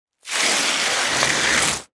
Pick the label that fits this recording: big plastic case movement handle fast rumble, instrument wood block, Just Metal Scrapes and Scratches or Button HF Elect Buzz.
Just Metal Scrapes and Scratches